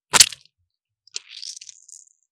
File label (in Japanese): 射精音